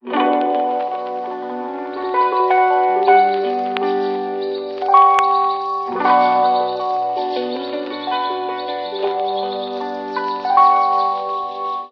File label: keys, sad loops